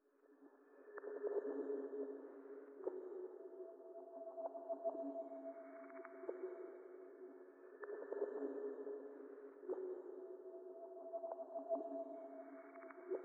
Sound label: 140 BPM C# Min Blips; Loop Stems; Cymatics; 2022 Melody Collection; Wavy; Drift Away